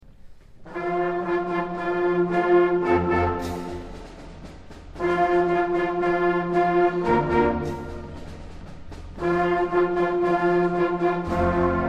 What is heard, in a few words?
march